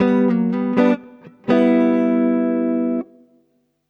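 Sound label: Accomp, jazz guitar; джазовый; гитары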